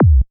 kick sub

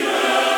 choir

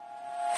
musicbox

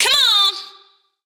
vox

